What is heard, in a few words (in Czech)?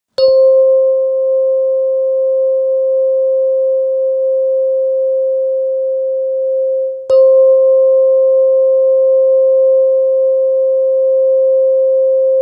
528 Hz, terapeutická ladička; terapeutická ladička 528 hz dna oprava